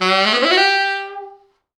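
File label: tenor sax runup, G3; East West; Quantum Leap Horns Sax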